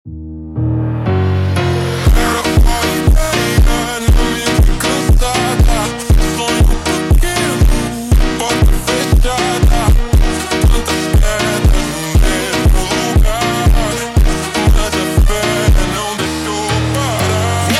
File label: Montagem super Slowed